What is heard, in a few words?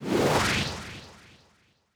symbol Drop; netent